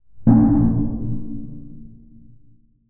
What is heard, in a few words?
a distorted demonic laugh